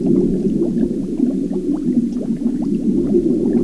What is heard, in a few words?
WHIRLPOOL 8Bit; abbottabad compound